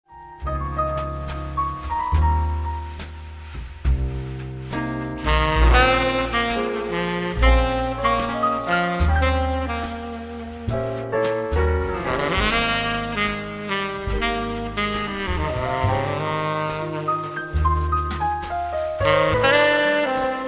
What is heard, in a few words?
piano lounge sax